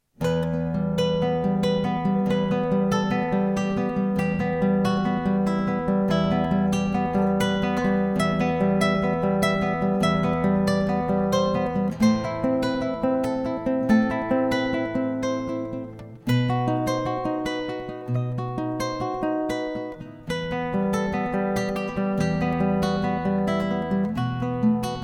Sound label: Spanish Ballad